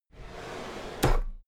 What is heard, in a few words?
drawer cabinet equipment open regular